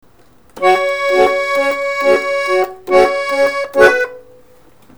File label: Long note, garmon; basovykh klavishakh